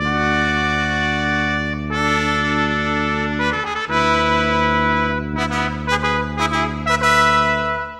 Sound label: Brass, Orchestral, Rock Pop; Sonic Foundry (Sony Creative Software); Orchestral Series VOL-4 - Rock and Pop